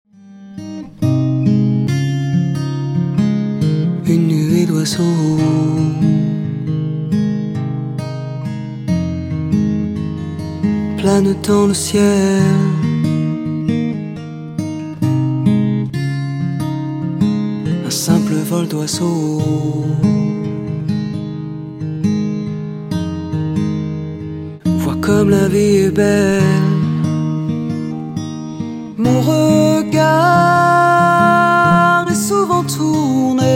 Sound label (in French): GUITARE VX